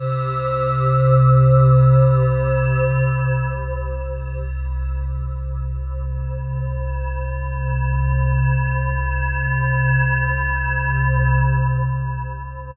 Pad; Dream Zone

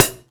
Prepared Drums

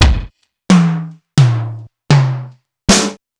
Simmons SDS2000

